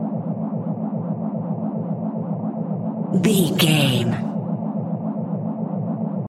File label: sci-fi force field hum loop